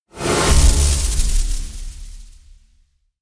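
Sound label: hit swoosh; logic circuit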